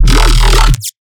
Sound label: bass hit Growl Blast D#; Virtual Riot